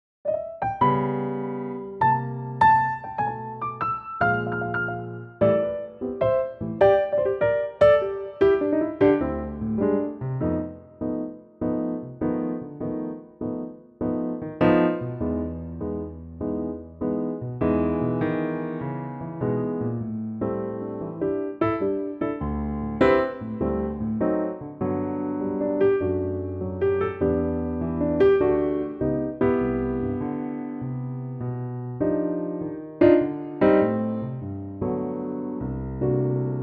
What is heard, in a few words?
G Piano trak